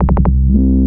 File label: combat scan; npc; Half Life Alyx